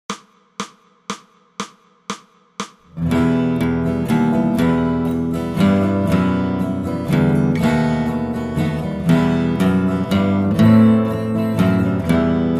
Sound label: Bass Solo; christmas; Coventry Carol